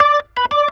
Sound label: Organ Lick; Ambient Grooves; Organs Licks